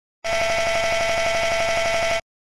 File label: CD Skipping Sound Effect